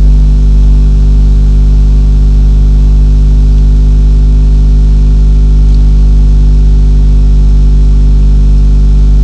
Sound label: low beam